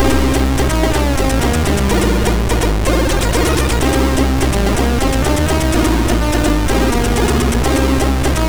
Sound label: MOS6581